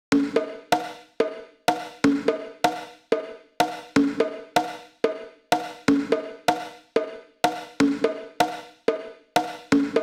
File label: Conga Room